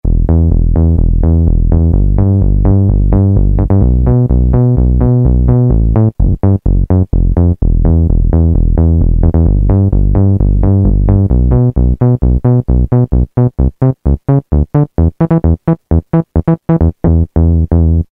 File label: SH101 Bs, Roland M-DC1